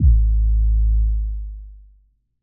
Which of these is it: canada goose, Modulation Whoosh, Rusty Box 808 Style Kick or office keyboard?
Rusty Box 808 Style Kick